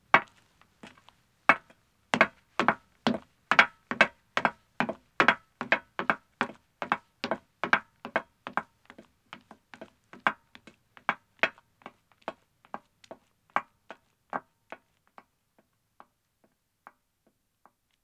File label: Metal Stairs Descending, 1 Wom, Footsteps; BBC Sound Effects Library